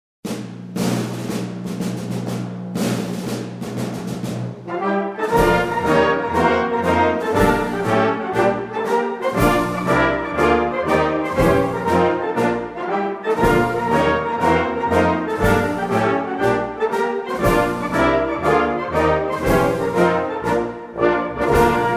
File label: anthem algeria